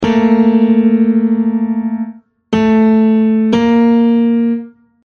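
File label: minor second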